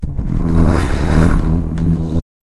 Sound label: jingle